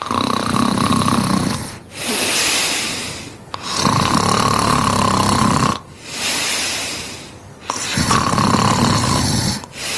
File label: zzzzz